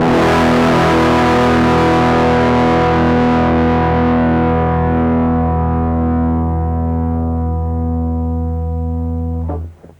bassement plague fat, distortion

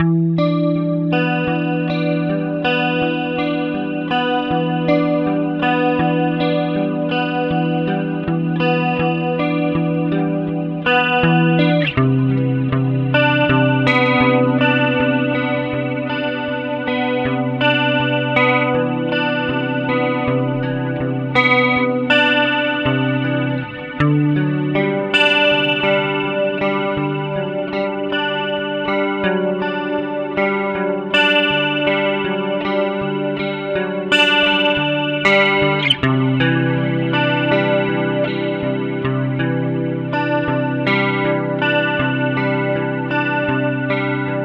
cosmic milk circle, chorus

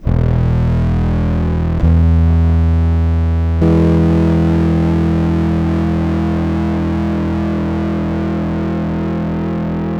bassement plague mix, distortion

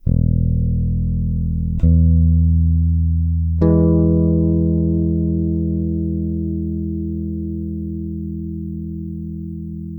cosmic milk xover 10k, chorus

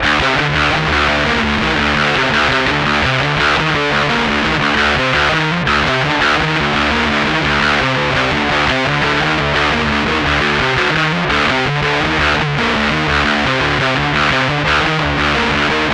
drop d, distortion

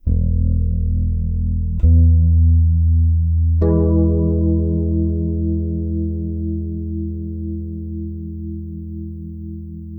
cosmic milk xover, chorus

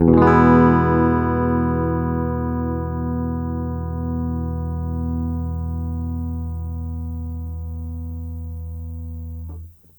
tetraplopia 2 voices center, doubler; Four-voice stereo doubler.

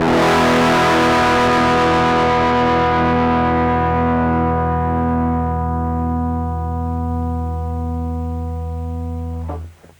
bassement plague fat, distortion

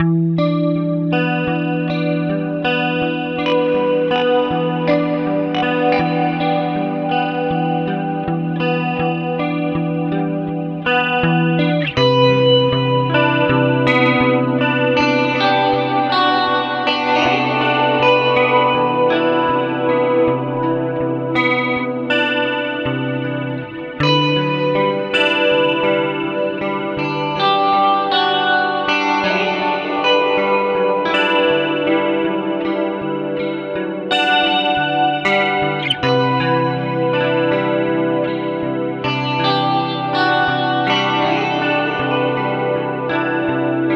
circle, doubler; tetraplopia

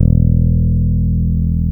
beer belly dry, enhancer

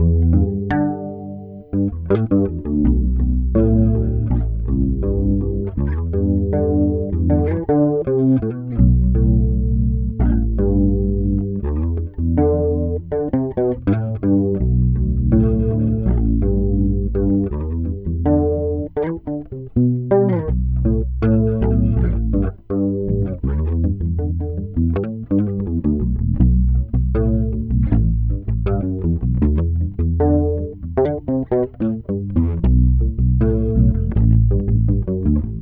cosmic milk bass, chorus

